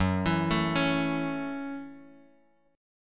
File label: F sharp Minor 7th F sharp, Guitar Drop D